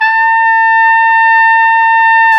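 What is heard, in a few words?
trumpet, jazz; Roland L-CDX-03; Tp 5 RCA Jaz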